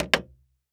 Foley Footstep Hard Sole Dress Shoe Jump On Wood Board Walk; Foley Footsteps